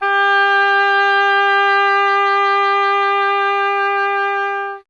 WND OBOE, Solo Instruments

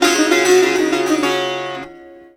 SITAR GRV, World Colours; Best Service

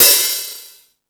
High Hat, Drum Machines

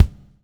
BUBL, BUBBLE; Spectrasonics - Backbeat

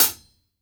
hit, hi-hats; Sampleheads; New York City Drumworks VOL-1

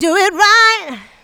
Female Vocals; Houseworx

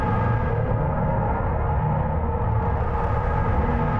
Tonal Re Draw, sparse soundscape

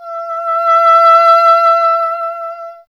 WND, Solo Instruments; E.HRN; Miroslav Vitous - Solo Instruments VOL-2

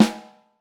Vintage Snare Drum, 6.5X14; Q Up Arts; Johnny.C's Vintage Snare Drum

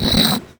SOUND EFFECT, Metal; AKAI S6000 CD-ROM - Volume 1

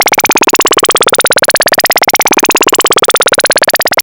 oscillator, VCS FX; Zero-G Ambient 1

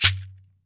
MARACA TONE; AKAI S-Series CD-ROM Sound Library VOL-5; SHAKER.MARAC

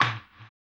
HAMBONE; Blues & Soul

